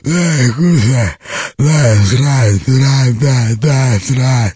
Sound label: zombie idle